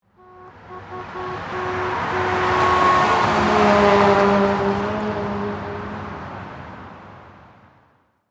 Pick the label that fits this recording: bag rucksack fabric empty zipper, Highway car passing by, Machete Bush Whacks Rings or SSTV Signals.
Highway car passing by